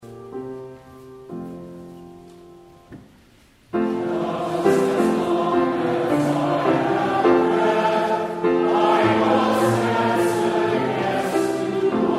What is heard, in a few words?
Hymn